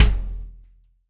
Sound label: Kick Shard; ZeroGravity Drum Shards